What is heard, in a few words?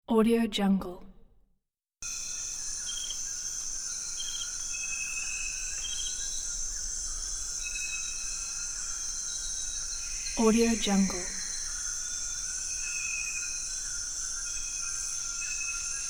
tropical forest; alexleadsounds